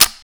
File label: Mach Gun Dry Fire; Foley; Doom